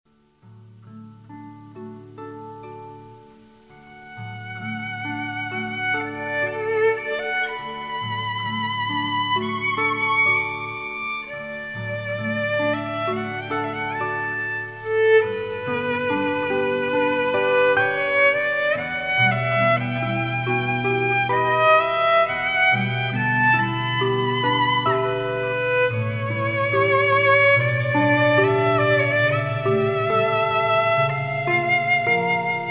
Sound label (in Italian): ARPA E VIOLINO; arpa per matrimonio